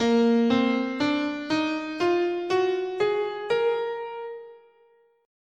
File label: Asharp Fifth Mode